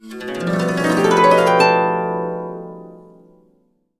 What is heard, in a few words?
A, Harps; USB Soundscan vol.62; Complete Harps; AKAI